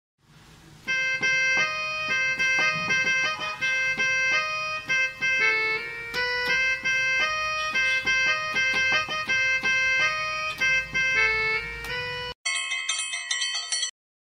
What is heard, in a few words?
bgm piano; soodhu kavvum